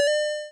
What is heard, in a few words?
8bit kit coin; LO FI